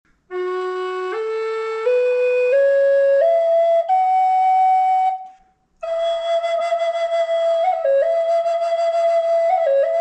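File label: reverb, flute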